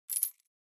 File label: other player cash out